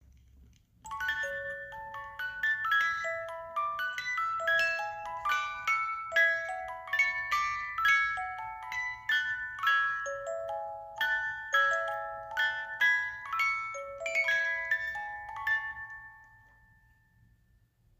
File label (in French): 18 lames, boite musique, manivelles